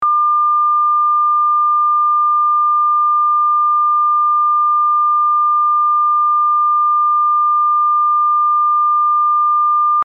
1200Hz

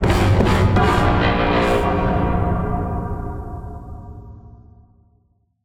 MiniBoss Spawn Warning

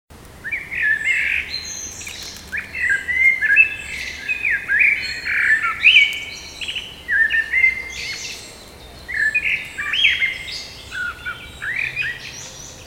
Birds In Evening Forest Loop; Ezra The Stranger; By Fxprosound

